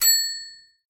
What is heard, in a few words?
ding